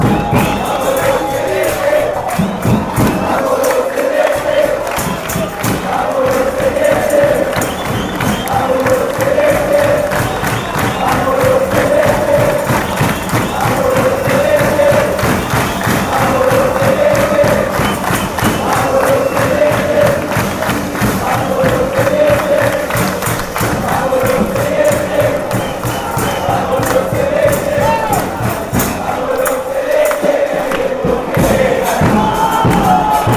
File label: come on blues, chants